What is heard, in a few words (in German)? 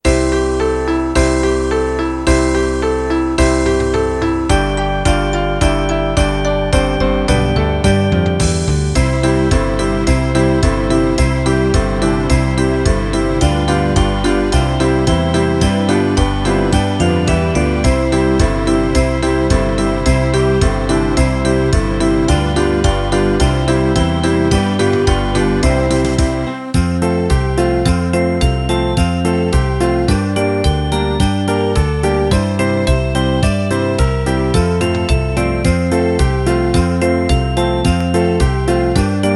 schulmusik jingle bells playback